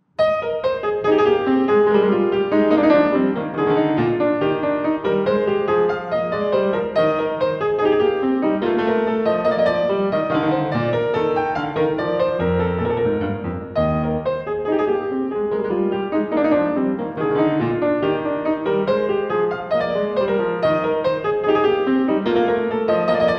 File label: French Suite No. 4 Gavotte